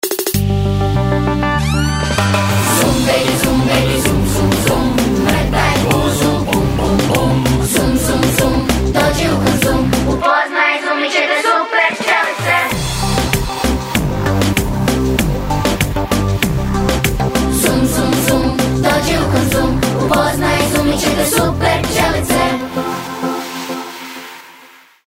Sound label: ringtone